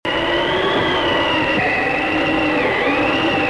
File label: wind blowing